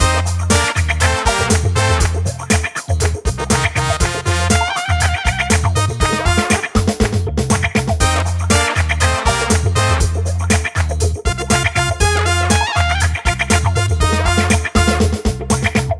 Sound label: Go to funk